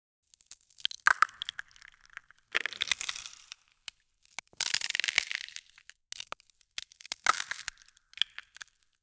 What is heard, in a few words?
sharp ice crack deep froz